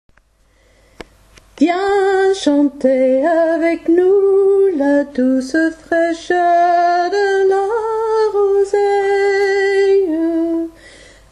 alto voix viens chanter avec nous

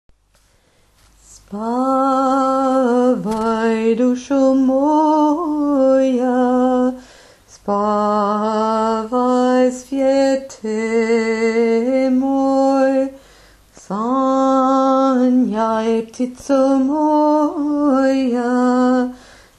voix d hommes

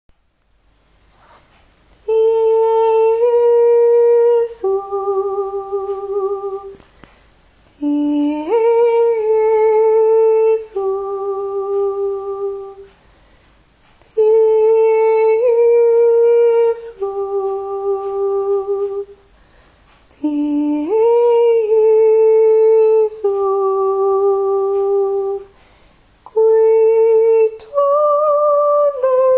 mezzosoprane; pie jesu

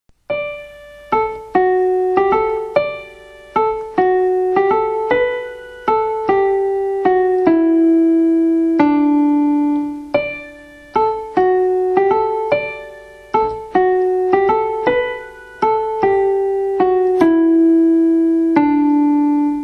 soprane clavier

